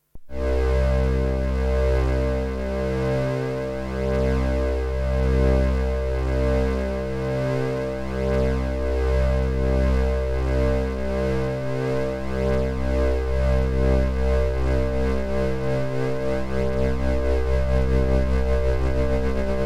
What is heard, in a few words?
lfo vca; arduino